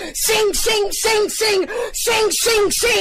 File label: sing sing sing sing